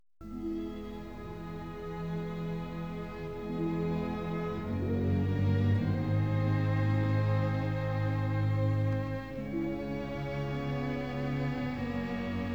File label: BASS track only